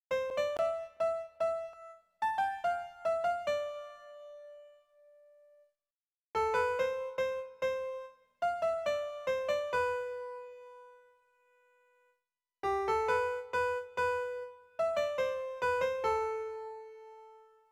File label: piano midi